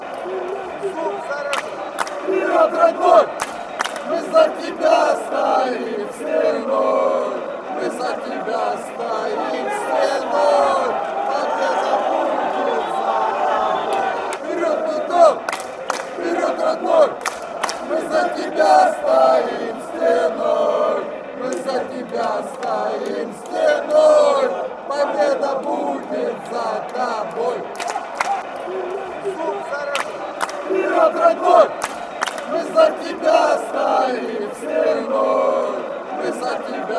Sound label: metalist chant, fanchants, ukraine